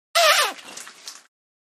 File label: Eleph Trunk Squirt, water